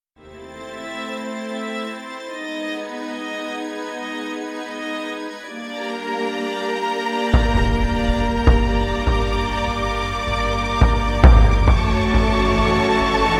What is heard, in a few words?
Goblin, Tension 공포